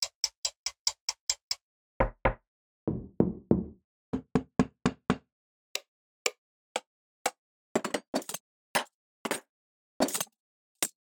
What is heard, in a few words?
Foley; mg pro essential foley vol 1 justm